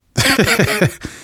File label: laughter, survivor voice; manager; Left 4 Dead 2; left4dead2 dlc3